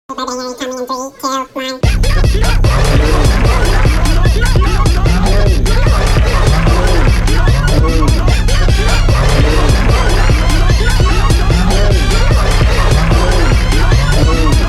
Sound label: Goofy beat